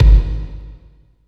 KICK, Tekno Industrial; East West

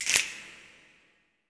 snap afro, afro snaps, hands; Roland LCDP14 Africa VOL-2

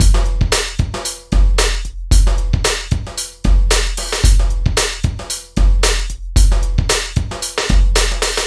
RAPIDO 113, LOOPS

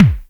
Bad Kick; Roland MV8800; DAANZ KICKS

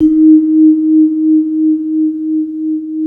MLT VIBE

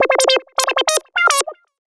lead (brass)

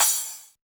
Crash, Yamaha DJX 2B

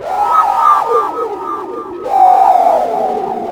SPACE FX; Big Beat Samples & More; AKAI; OTHERFX KIT

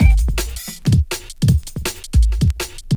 Oo Yeah 162, breaks; minihalfs

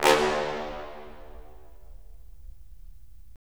BRS, Roland LA Composer

